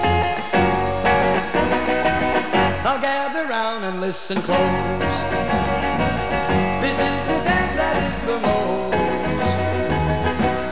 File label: clap